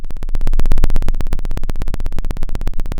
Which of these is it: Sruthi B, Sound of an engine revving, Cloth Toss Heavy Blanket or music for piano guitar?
Sound of an engine revving